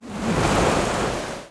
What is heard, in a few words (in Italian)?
onde del mare, natura